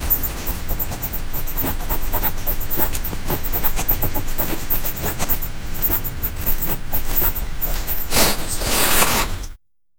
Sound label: someone is knitting